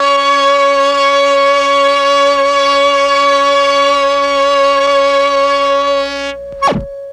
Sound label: MEL STR, Legendary M400; ILIO; Propeller Island